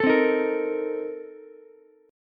A sharp Major 7th Suspended 2nd Suspended 4th, Ukulele; strummed; JGuitar